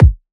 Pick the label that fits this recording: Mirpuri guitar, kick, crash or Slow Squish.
kick